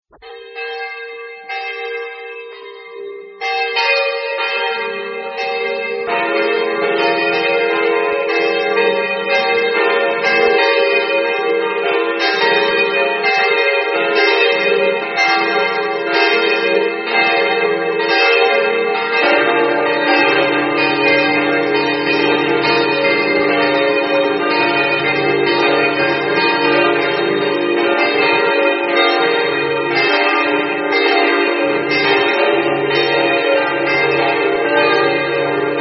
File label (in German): Kirchenglocken